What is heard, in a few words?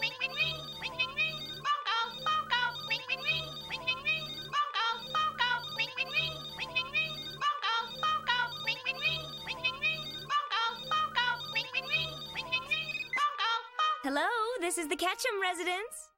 Pokémon Phone Call, RING RING RING PHONE CALL